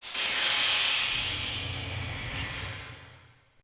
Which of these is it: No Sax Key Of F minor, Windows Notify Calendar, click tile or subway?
subway